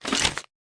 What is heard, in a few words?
pickup; superheroes2; squareinch